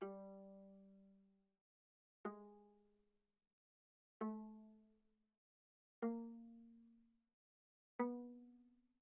Viola pizz sul G pp G3 B3

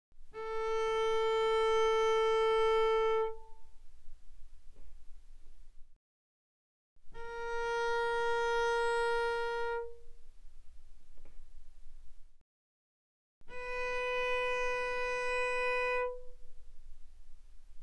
Cello arco mf sul A A4 A5; mono; Strings

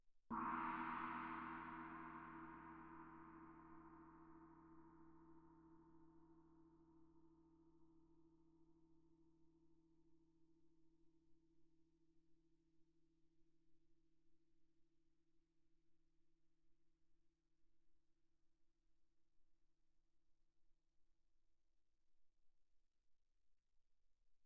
chinese mallet mf, suspended cymbals; Percussion

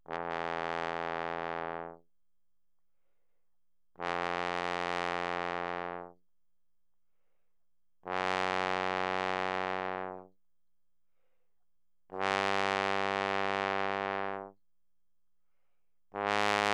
Tenor Trombone ff E2 B2

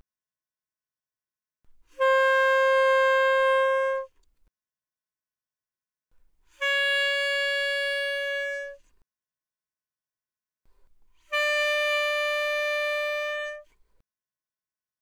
Alto Sax No Vib mf C5 Ab5, alto saxophone

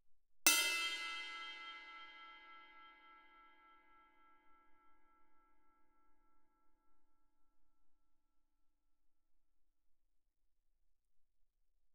crash stick bell ff, suspended cymbals; Percussion

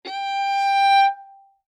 Violin arco ff sul D G5; MIS Pitches - 2014; Strings

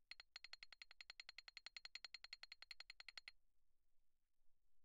xylophone rosewood roll mf C8; Percussion

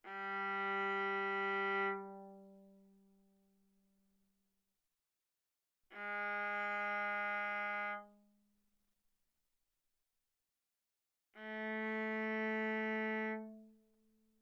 Viola arco sul G ff G3 B3

